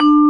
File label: VIBE